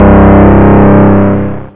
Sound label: tilt; Crystal Caliburn DEMO